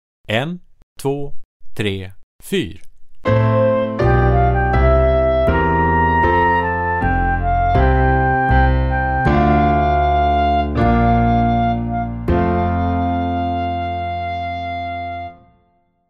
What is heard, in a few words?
Chords; Score